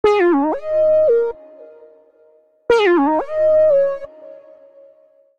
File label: Bb Minor 89 bpm; MIDI Clips; Tonal; Melodic; Evening Casual; Ableton; Factory Packs; Chop and Swing